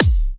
Dance Kick

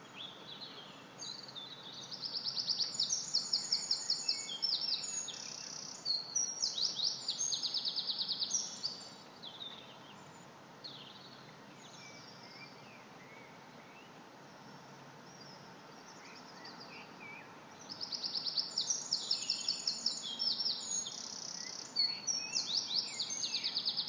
Wren

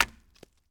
Hit Wood

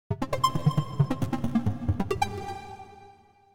Synth top Move up

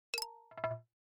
Xylophone Sting

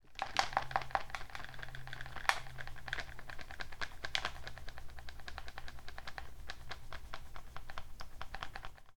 Shaking Plastic

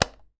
Light Switch On

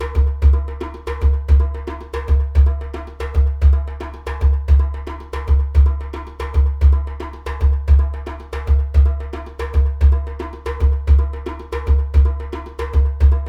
Djembe Loop

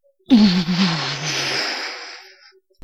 Sound Boom

